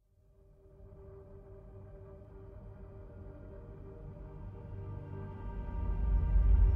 Epic Powerful Orchestral Action

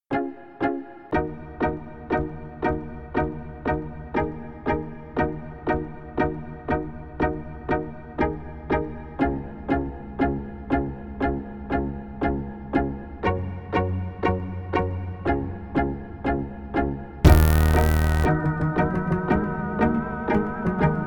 Instrumental Version

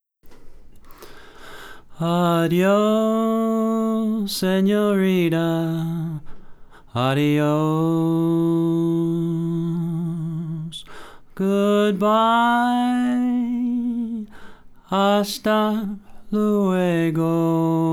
echo reverb source; AUDIO ENGINEERING

